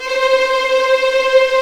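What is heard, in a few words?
C5 tremolo, String; East West; The Ultimate String Collection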